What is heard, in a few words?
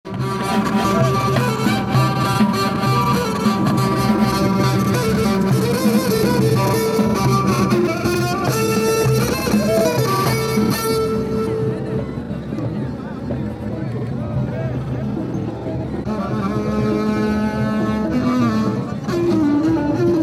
Marrakech Jamaa El Fna Musicians